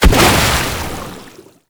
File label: Bubblegun Pistol End Explosion; Warframe; Tenno